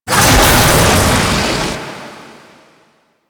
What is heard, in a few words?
Spit Attack Launch; Warframe; The New War; Archon; Nira; Abilities